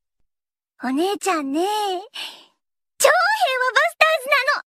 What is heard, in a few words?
Message Tone; Anohana